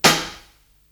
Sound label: Hihat Foot, Psychedelic Drums